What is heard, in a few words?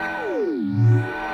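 Crane Move Vertical Loop; Venus Open World; Objective Rooms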